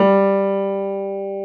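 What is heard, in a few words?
piano G3, soft piano; AKAI S-Series CD-ROM Sound Library VOL-2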